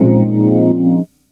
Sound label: Chords Tones; Equipped Music; Loungin House